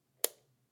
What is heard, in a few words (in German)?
Lichtschalter